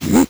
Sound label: hm; Crypt of the NecroDancer